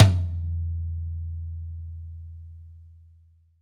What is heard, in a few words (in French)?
Tom basse